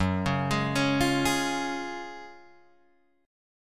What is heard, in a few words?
Gb Major 7th Suspended 2nd, Guitar Open G